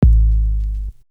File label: Drum; Coldcut's Kleptomania VOL1